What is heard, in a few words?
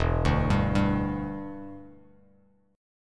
E Minor 7th Bass Standard; 0,5,5,0; arpeggiated; JGuitar